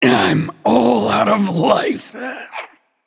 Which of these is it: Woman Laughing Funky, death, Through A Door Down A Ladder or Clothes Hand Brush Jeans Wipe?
death